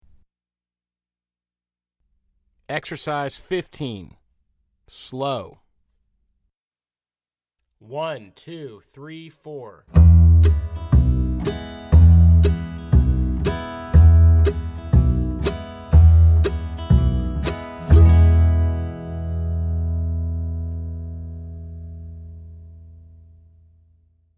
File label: Slow